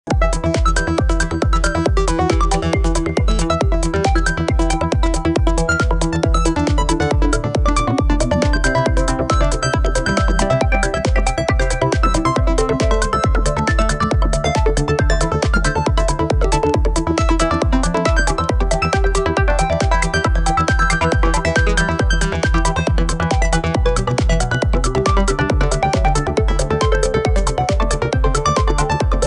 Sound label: a tech house track